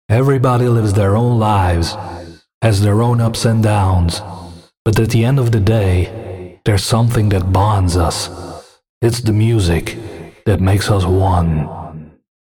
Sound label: Hardcore